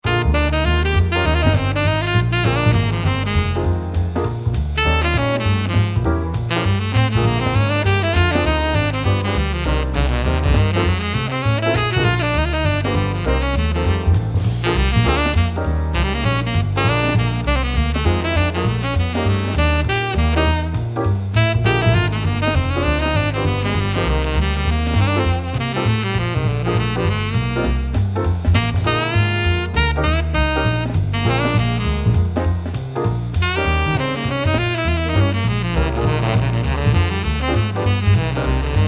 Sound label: jazz; tennessee